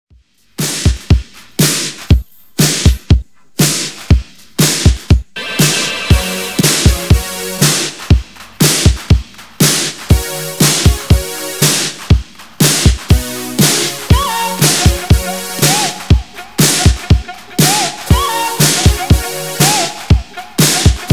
Redrum 120 Bpm

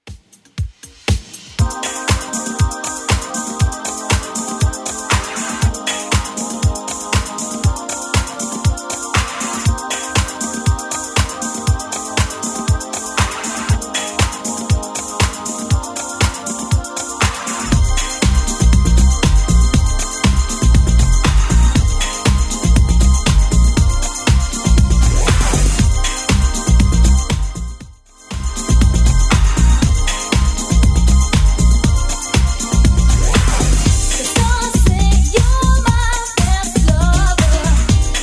80s 119bpm

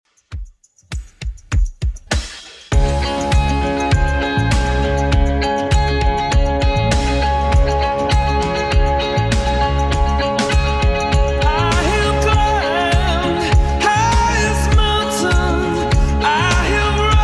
80s Redrum 100bpm

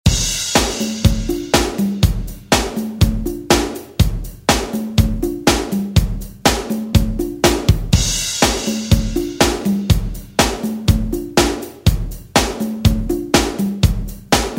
90s ReDrum; DJ Mhark; remixplanet

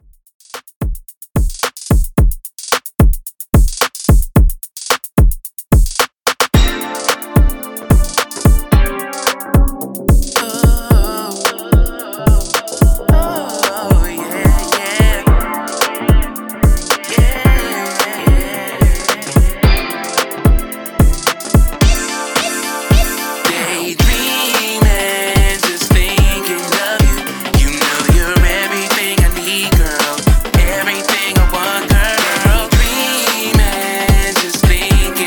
Redrum 110 Bpm Dirty

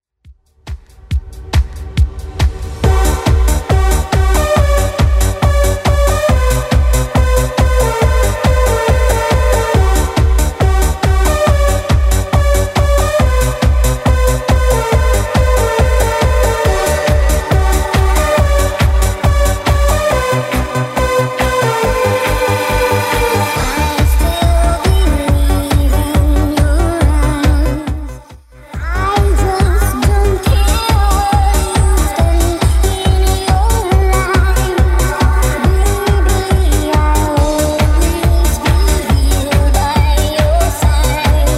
90s Redrum 139 bpm

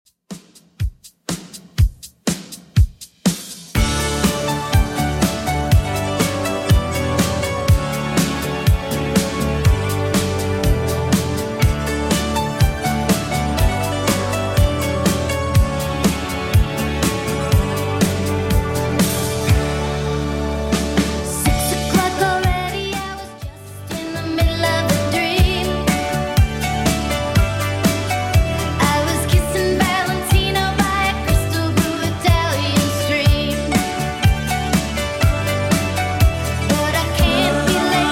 80s Redrum 122bpm

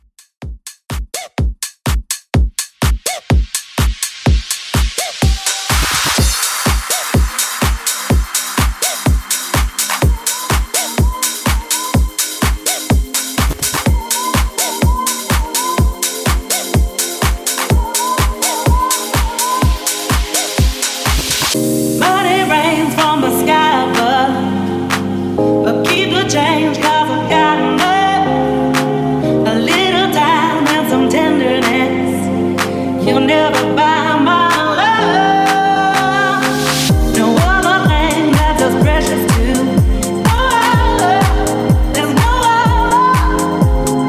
House Repeat 125 Bpm

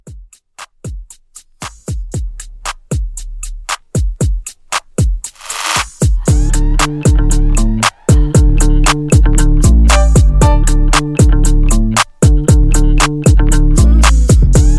Blend

